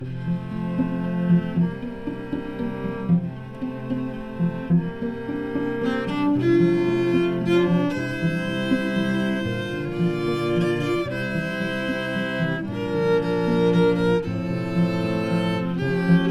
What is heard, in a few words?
Cello Ensemble